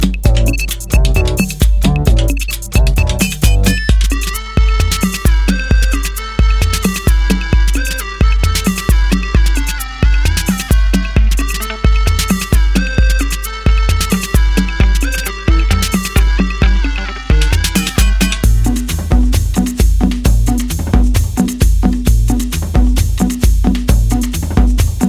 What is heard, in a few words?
808 cosmicumbia dub